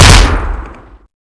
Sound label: maxim shoot, weapons, ww2; sven; ww2projekt